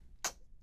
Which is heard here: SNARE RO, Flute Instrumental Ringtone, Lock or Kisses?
Kisses